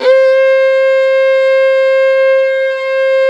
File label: violin C3, Balkanish Traditions